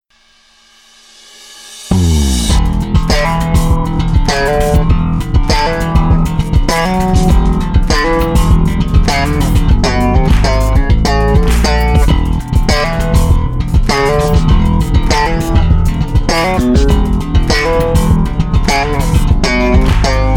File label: me-20b patch; ME-20B Bank 4, No. 1; Chorus sound suitable for slap solos.